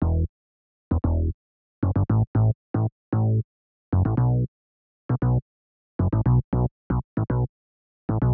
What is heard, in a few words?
Bass, Am 115bpm; Zero-G; Nu Disco; Construction Kits